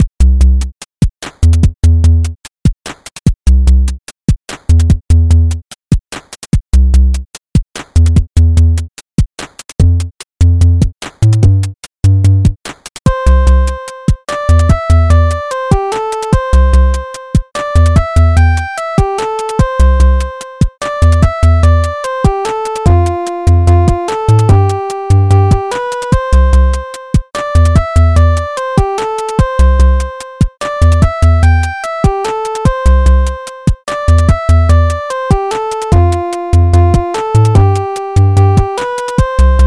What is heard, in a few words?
chiptune